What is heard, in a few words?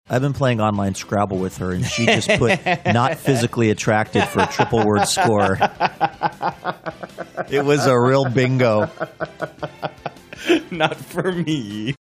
extended laughter; online scrabble